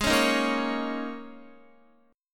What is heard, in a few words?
G sharp Suspended 2nd Suspended 4th, G sharp, down, Guitar; strummed